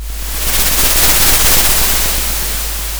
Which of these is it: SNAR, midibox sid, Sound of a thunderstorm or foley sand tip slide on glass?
Sound of a thunderstorm